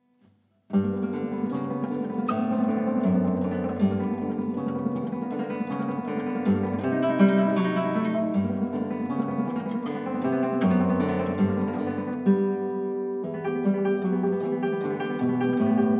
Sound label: Catalan Guitar Music